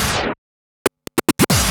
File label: Scratch Fills 140BPM